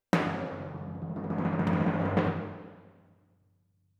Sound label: Twin F Timp